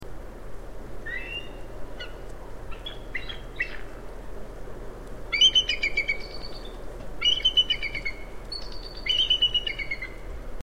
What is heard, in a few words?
bald eagle